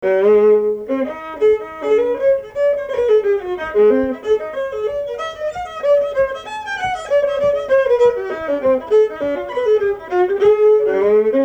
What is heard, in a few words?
reel a dur